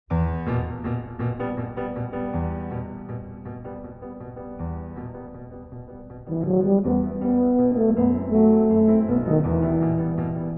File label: spanish Dance, tuba